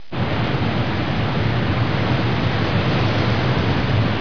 nature

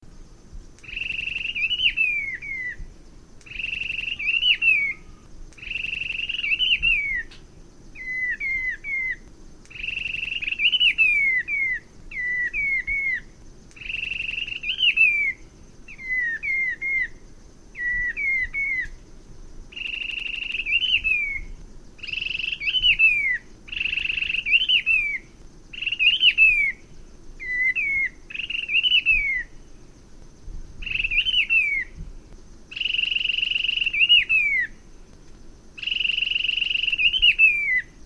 streak barwing

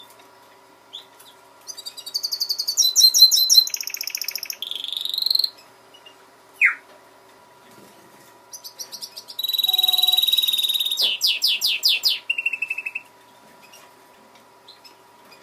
greenfinch mule

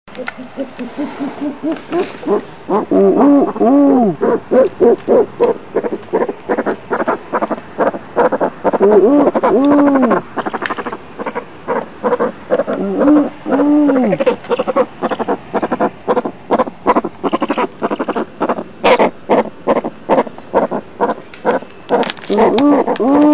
bubo africanus

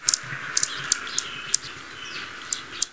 anhu

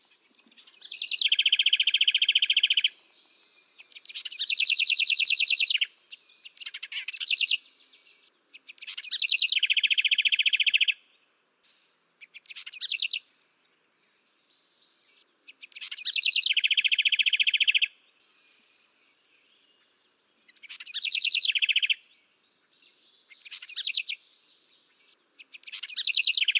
housewren

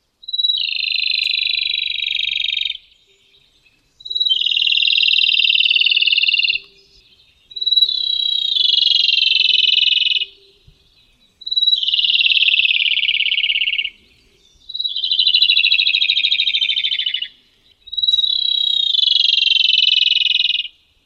suara rofous headed robin

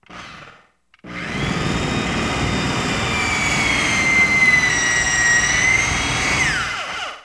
tools

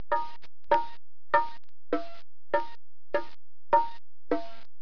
musical

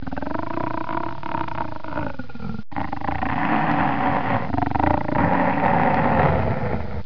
horror

